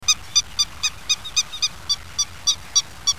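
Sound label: grey-headed lapwing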